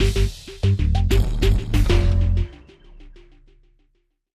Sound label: Jingle Shark Start; Super Rub 'a' Dub; PS3